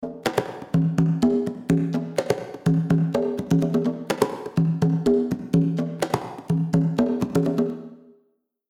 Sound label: Reverb Pre Delay 0